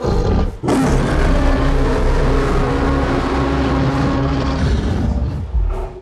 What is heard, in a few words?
roar; kong 2024